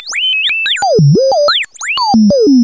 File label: CLICK SEQUENCE LOOP; KillerTweaksII; DirectWave